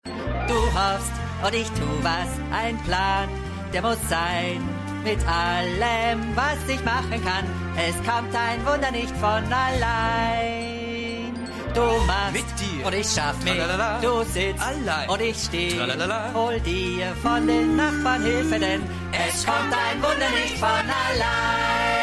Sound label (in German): Gesang; Serienfuehrer Weihnachtsfolgen Weltweit; Stimmproben